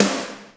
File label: ROK SNARE, Ensoniq ZR-76; 300 Drum Machines